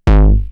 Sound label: Roland TR-909 BD; BD Selection